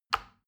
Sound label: plastic button tiny push regular